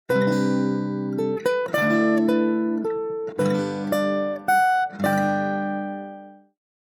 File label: guitar variation, alarms; ZTE Nubia Z17 Mini; nubia mile